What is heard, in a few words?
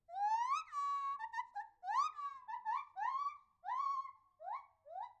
hylobates lar